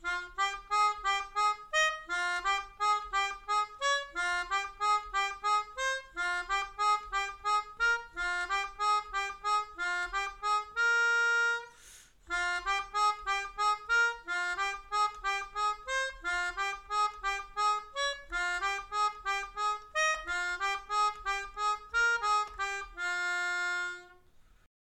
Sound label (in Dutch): Mijn Melodie, Melodica